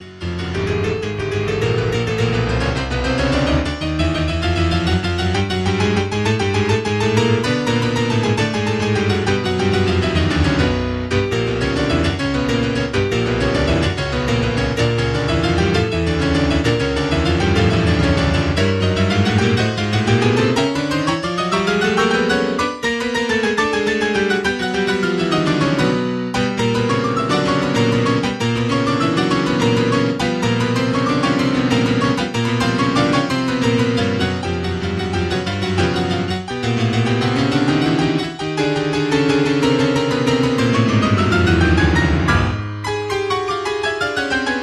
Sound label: Etude in B minor Octaves Allegro con fuoco